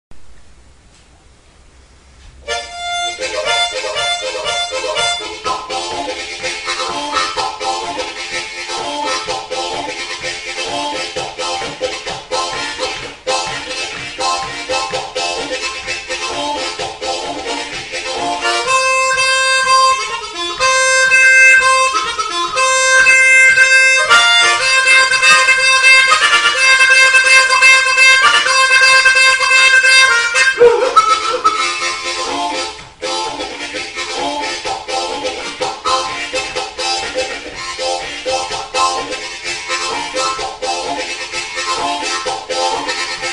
harmonica

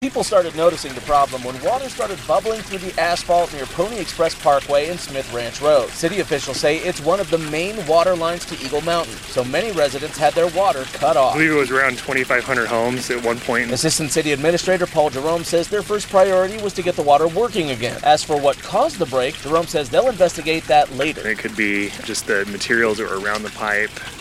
massive water main break; in eagle mountain

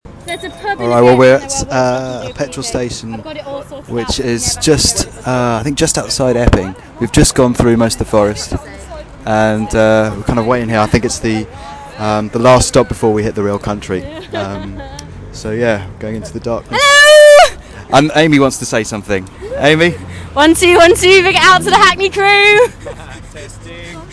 shell garage; epping

